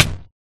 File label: hit; Minecraft